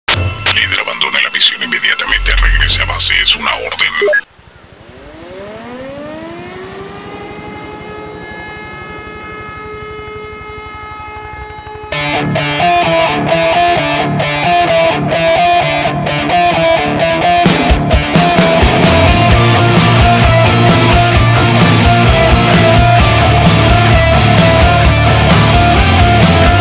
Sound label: escape music; cs16; ze paola city cso